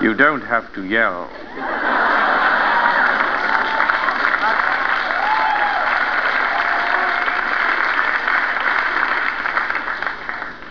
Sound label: annoying